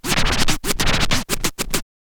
scratch kit, vinyl; Masterbits; Vinyl Attack

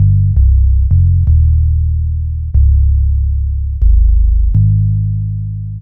165bpm Dm, bass loops, drum & bass; Total Drum&Bass

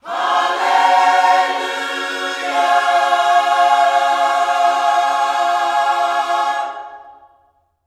HALLELU, Vocal Planet Gospel; HALLELUJAH

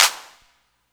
CLAPS HOU; Best Service Dance Mega Drums

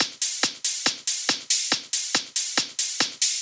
Hh Loop, Drum Loops 140bpm; Zero-G Trance Inducer

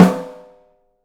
OHSTSN L, 3D Drums

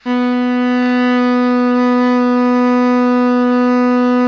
BARI PP B2, Sax; Giga Samples Collection; BARITONE PP

